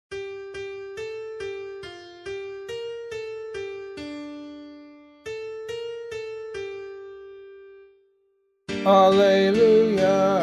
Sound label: vocal, Gospel Acclamation for Australian Catholic liturgy